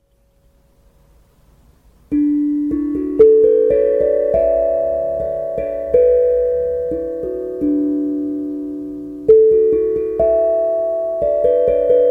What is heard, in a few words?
D Major Bright